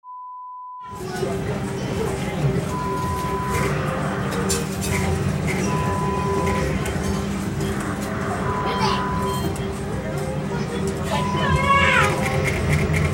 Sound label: arcade sound